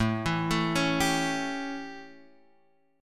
A 7th Guitar Drop D, chord

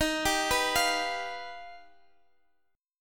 G Augmented 7th

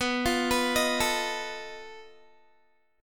B 7th Flat 5th B Guitar; B7b5; arpeggiated

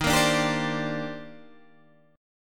D sharp Major 13th D sharp Guitar DADGAD, chord

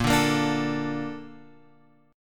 A sharp Major 7th; A#M7 Chord {6 5 8 7 X 5} strummed

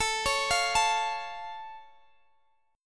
A Augmented A, Guitar Half Step Down

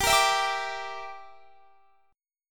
Bb 6th Flat 5th G

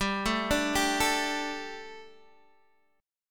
G Suspended 2nd, Guitar DADGAD; chordshape; down

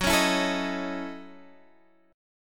F sharp Minor 13th, Guitar, Half Step Down

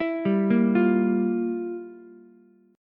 F Major 7th Suspended 2nd Suspended 4th, Ukulele; arpeggiated; 9,7,6,8; JGuitar

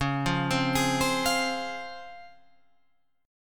Db Major 7th Db down Guitar Open F; arpeggiated

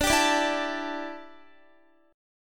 D Suspended 2nd Suspended 4th D down Guitar

